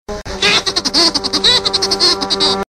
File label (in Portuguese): melhor risada